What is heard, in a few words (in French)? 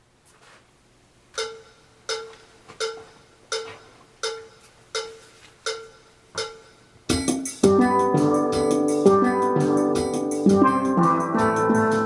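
Guitar Jam1 + PONT, Steel Band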